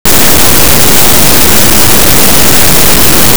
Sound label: shock